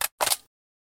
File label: machinegun magazine set